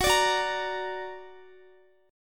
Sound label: Gb Augmented Guitar; Listen to Gb+ strummed